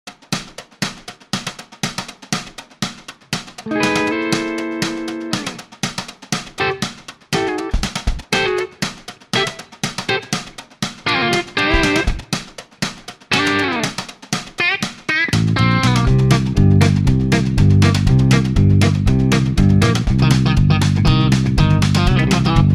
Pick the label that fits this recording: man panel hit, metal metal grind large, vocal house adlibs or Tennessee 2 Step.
Tennessee 2 Step